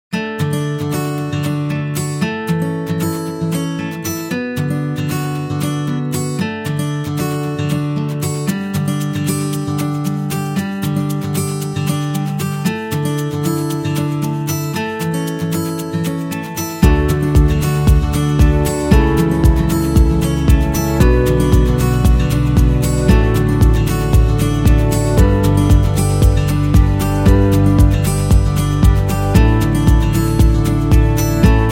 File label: Romantic Folk, happy music; happy music vol 8